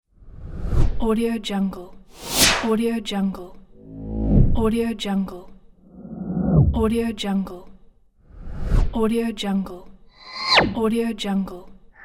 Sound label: woosh swoosh transitions pack